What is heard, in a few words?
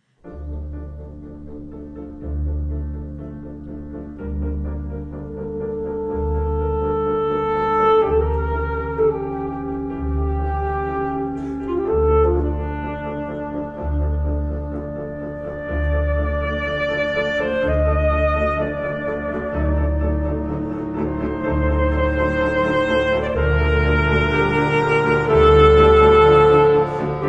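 concerto for alto sax and wind ensemble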